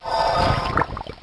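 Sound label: underwater breath; player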